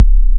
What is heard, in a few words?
kik, one shots, dance; Sonic Foundry (Sony Creative Software) - Future Retro Dance Excursions